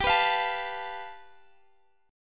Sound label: G 9th, Guitar, Half Step Down